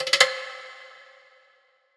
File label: perc short roll clay pot C; KSHMR; ENZO Drum Signature Bundle Vol 1